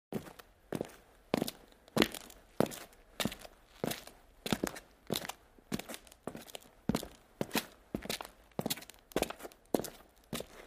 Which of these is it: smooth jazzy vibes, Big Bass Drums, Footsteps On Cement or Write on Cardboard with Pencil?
Footsteps On Cement